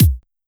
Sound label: soft hit whistle